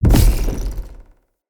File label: Galene Staff Caster Cast Long Shot, Weapons, Staves; Player